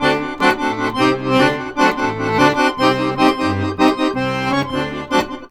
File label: ACC, Balkanish Traditions